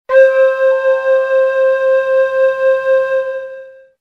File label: Velvet Wood Flute; synth patches Korg Prologue